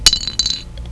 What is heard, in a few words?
Ball drop; Spheres 1.00